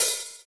Open Hhat, Hihat; Equipped Music; Loungin House

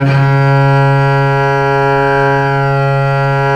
VL C#2, VIOLE, Historical Instruments; USB Soundscan vol.23

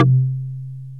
BOTL MARIMBA; OMI - Universe of Sounds

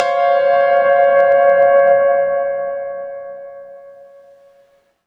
Reverb Piano; AMG - Prototype Drum & Bass

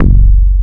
DISCO BASS

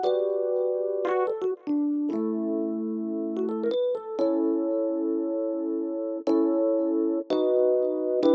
ElPiano, E 115bpm; Zero-G; Nu Disco; Construction Kits

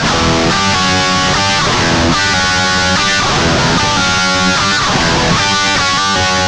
Guitar Licks; Best Service ProSamples vol.17; AKAI

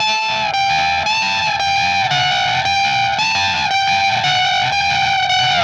Static FX Guitar

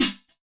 Snare Room, TR-606 Bent Samples; Sonic Foundry (Sony Creative Software); The Electronic Music Manuscript Collection; Battery 3 Sample Kits

